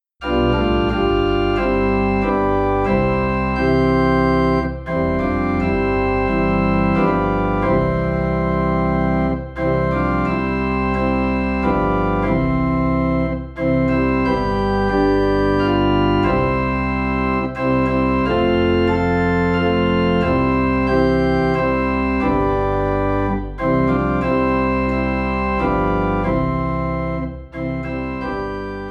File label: Organ Low in C; Trust and Obey